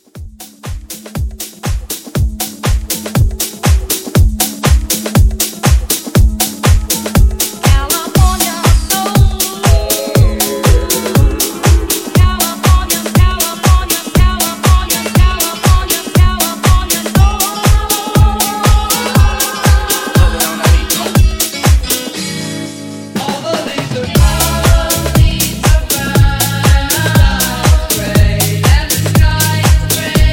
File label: Classic House